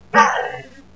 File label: pain